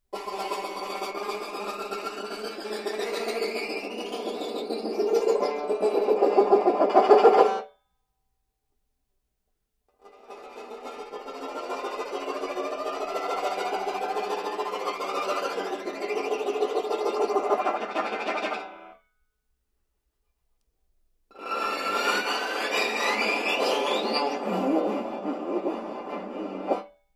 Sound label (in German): gewindestange, banjo